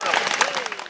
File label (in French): FOULE